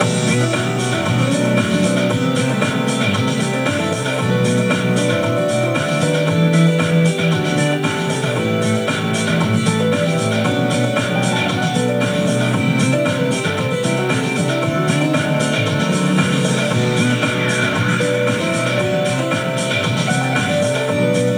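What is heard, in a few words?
background music pub; yakuza ryu ga gotoku kiwami 2 pc gamerip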